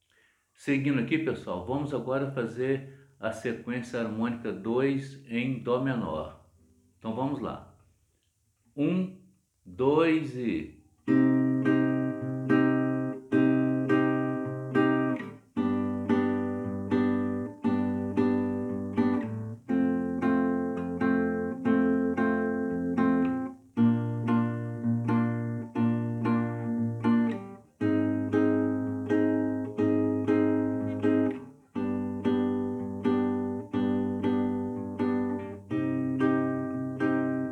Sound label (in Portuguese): Sequência harmônica em dó menor